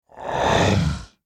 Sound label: Horror Monster Zombie Growl; Zombies